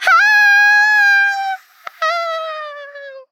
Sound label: Falling; Huntress